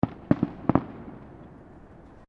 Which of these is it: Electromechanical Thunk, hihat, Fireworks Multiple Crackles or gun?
Fireworks Multiple Crackles